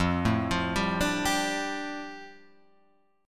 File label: F 6th Add 9th F down, chord; F6add9 arpeggiated